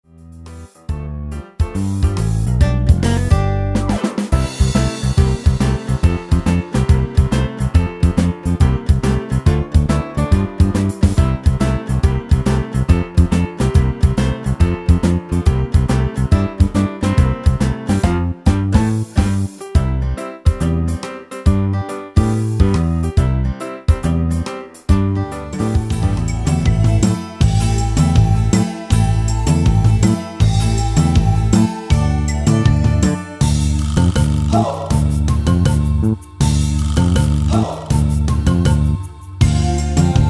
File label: Karaoke